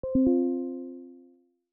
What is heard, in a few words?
Notification Mail; Microsoft; Windows 10x